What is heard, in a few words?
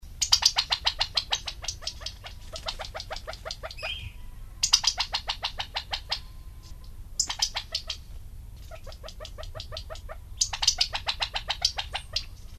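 California quail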